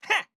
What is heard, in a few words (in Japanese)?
攻撃